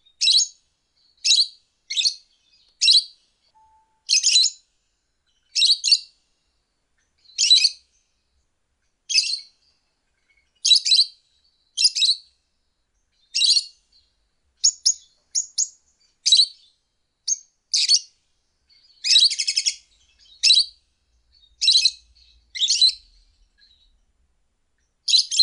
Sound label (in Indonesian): suara call lovebird